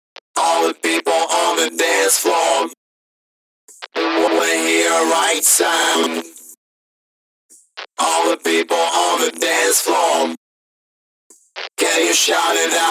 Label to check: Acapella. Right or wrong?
Right